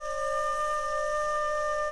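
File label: C sharp 5 with D5, flute multiphonics; modern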